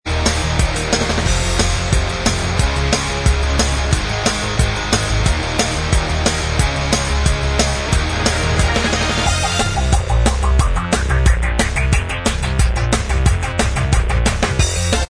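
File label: instrumental, backing tracks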